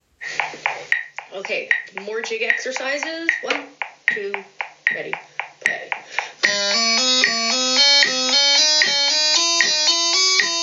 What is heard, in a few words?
Jig exercise 2 more, pipers